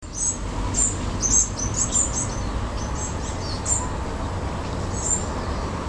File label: Ph trochiloides brood juv and maybe ad male